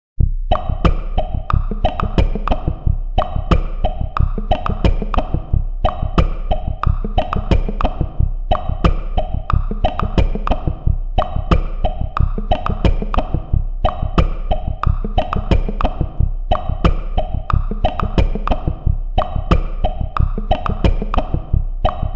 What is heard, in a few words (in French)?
Boucle; lanfeust